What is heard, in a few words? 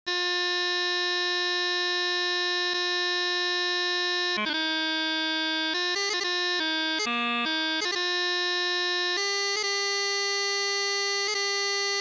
Chanter, pipe band